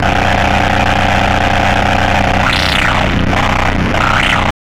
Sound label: Car Trash, Hard & Loud Techno